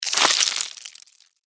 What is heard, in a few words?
death sfx; npc; cazadore